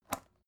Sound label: folder open light